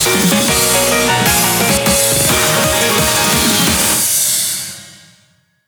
Bgm Reach

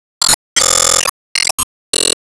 Se Sys Topleague Rateup

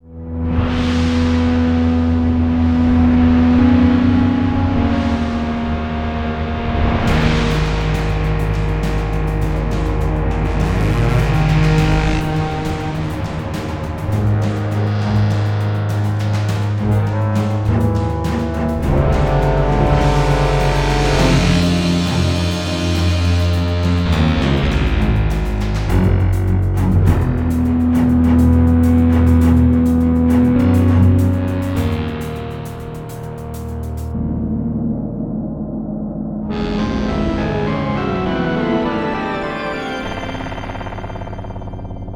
first fight music; Ben 10 Protector of Earth; Wii

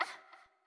Hurt; Winkubus

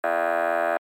boss2intro errorbuzz; The Binding of Isaac; SFX Monsters

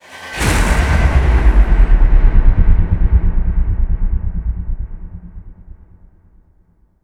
Stinger Modulate

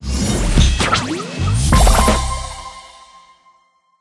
Reward Tokens; subway surfers city sound effects

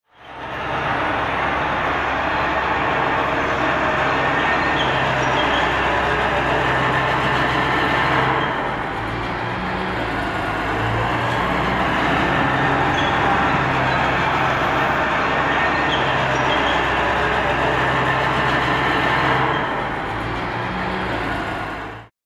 nyc Construction; Cities

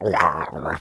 Feed; Waterski; Fifi

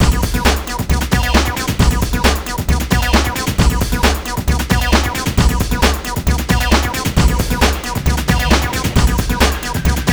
Ditty; NBA Inside Drive 2003; Xbox

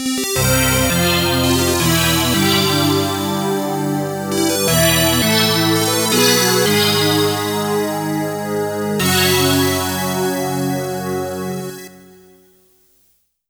Final Round Clear; Cyberlip; Neo Geo CD

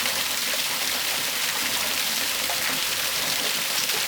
ambience; Rock 'n' Roll Climber; Wii

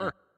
Hover Hands; Going Under

